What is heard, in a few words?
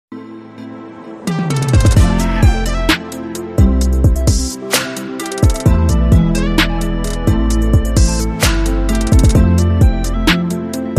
Pumping Keyboard Short